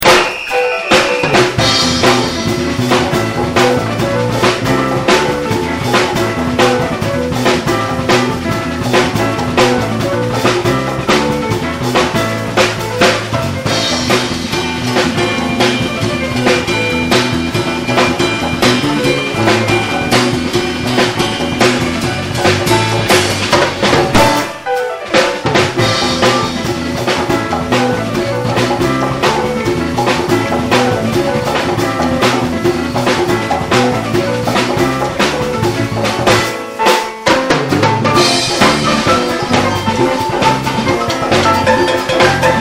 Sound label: cel finger; bass